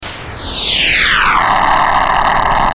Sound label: Descend Hiss Distortion Crackle; Descending Sound Effects; Blastwave